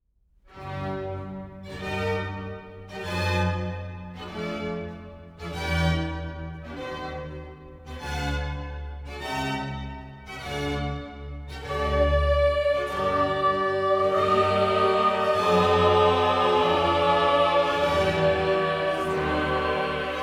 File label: Qui tollis; K427